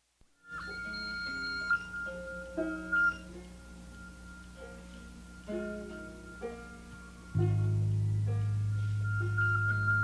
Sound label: instrumental